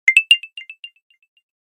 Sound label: Flicker; Coolpad 1827; notifications